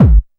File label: bass drum deep, techno trance drums